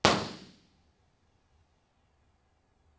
Lecture ImpResp

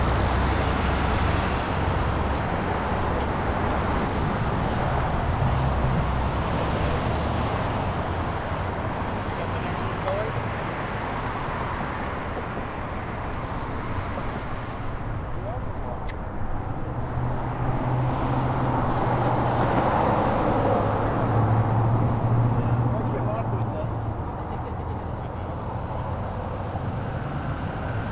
University Ave Background Noise

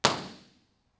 Lecture ImpResp

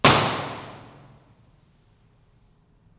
Stairwell Imp Resp 16k

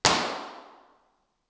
Large Room Imp Resp 16k

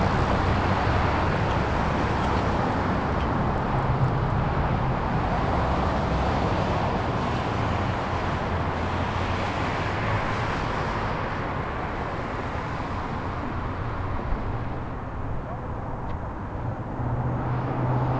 University Ave Background Noise

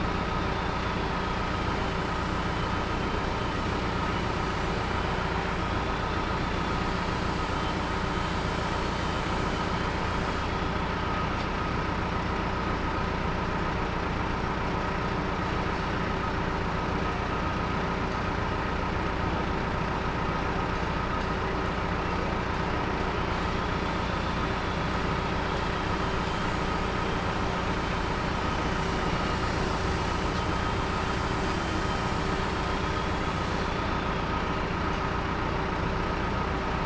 Construction Site Background Noise; blind source speech separation corpus; BSS

